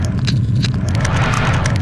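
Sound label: force choke loop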